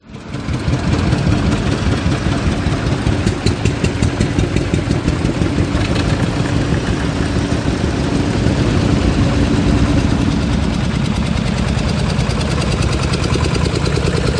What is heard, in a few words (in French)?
Son tracteurs; Rando Tracto 2014